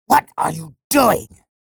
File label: pain ability damage; sorlag; low health